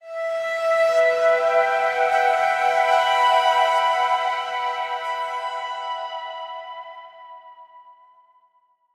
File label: Synth Emin